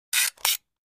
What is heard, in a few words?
Flash Gear High Pitch, flash gear